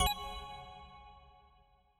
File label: click